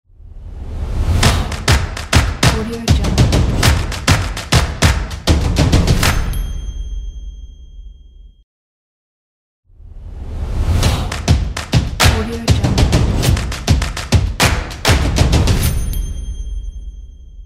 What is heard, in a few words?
stomp action logo